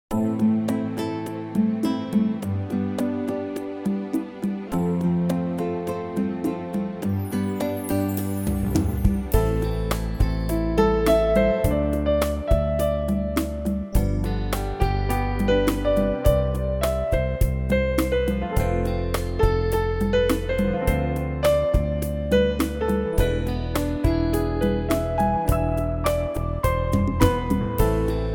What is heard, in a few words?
RUMBA